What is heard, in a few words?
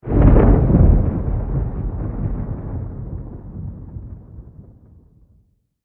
blowout Wave; STALZONE